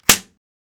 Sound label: Crossbow Fire